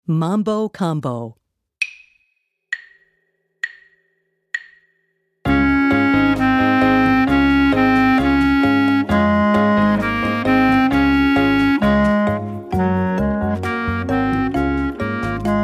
Mambo Combo

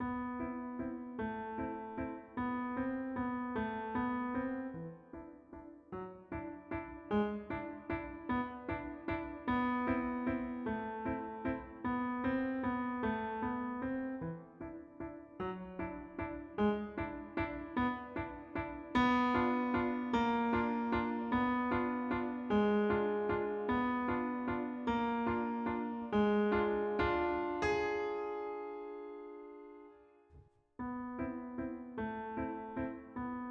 Haunted Waltz

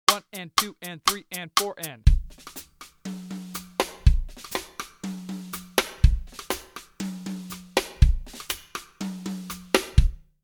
Quasi Rumba

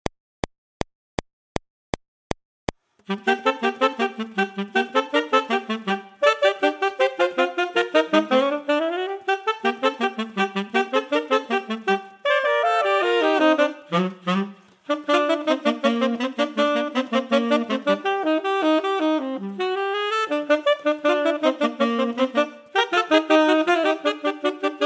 Tenor Duet; Hunting Wabbits

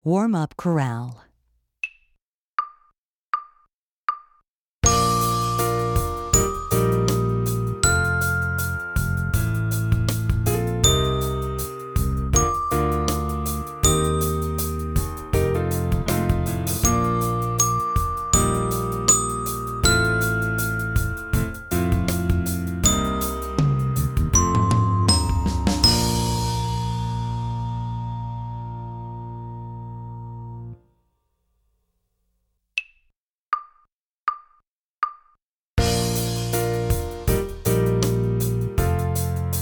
Warm Up Chorale

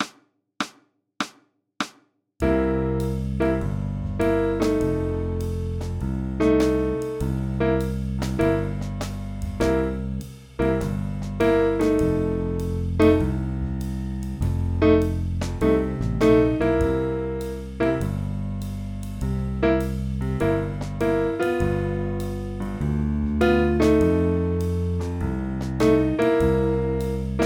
Blues Style

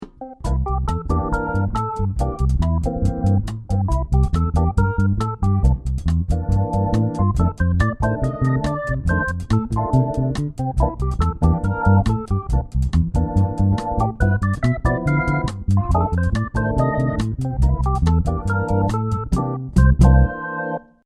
Passing Tone Blues

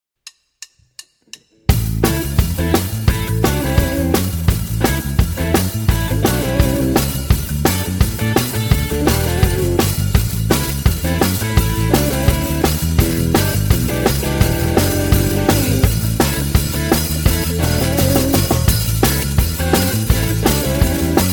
Sample Eighth Note Rock Blues Beat

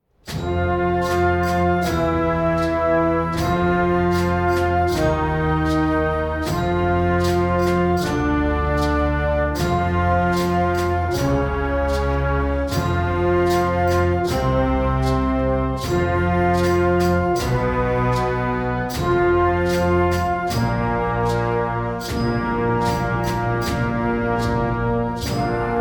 Expanding Intervals - Chromatic

